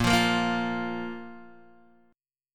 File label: Bb 5th Bb Guitar Open D